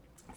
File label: Birds